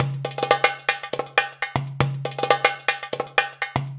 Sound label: PERLP, ArabianTraditions